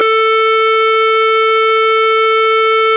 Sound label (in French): instrument la clarinette